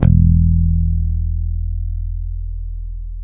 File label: E 2, go-go mute, bass; Spectrasonics; Bass Legends CD 1